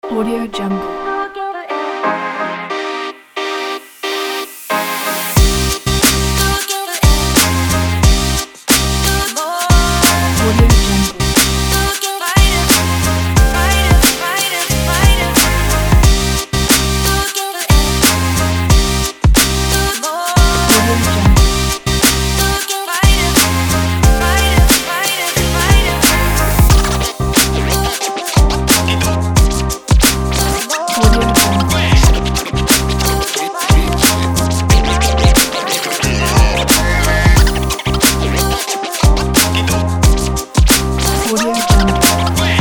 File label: modern hip hop